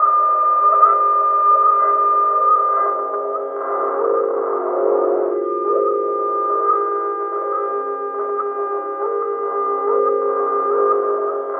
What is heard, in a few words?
RADIO HARP, Pads; Distored Reality 1&2